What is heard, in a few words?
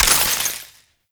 Ammo Pickup Shock; Ammo Ability; Warframe; Warframes; Frumentarius